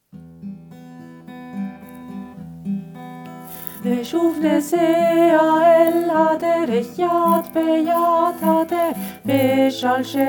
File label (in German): zweite Stimme; El haderech; Attisholz